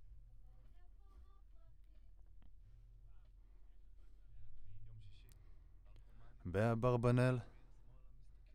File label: Voc OD